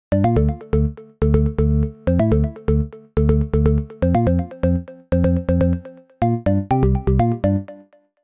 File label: Melody Pluck House Deep House; midi wizard 2; Unison